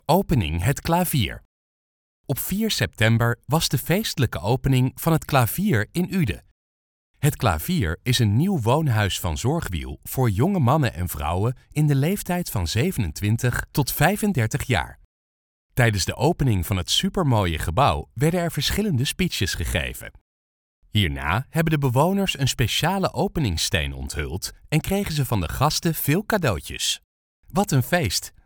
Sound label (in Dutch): Het Klavier